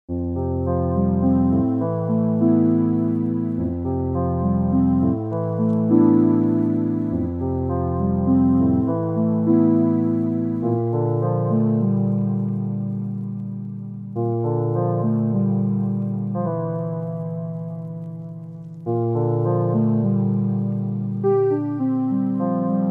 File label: Evening News Theme Spacer - Distant Vintage Organ